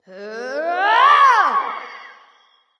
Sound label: battlecry light; smite; voicepack; hel